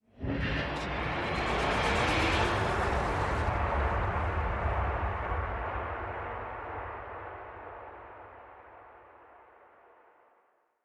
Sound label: Industrial Rise; soundscrate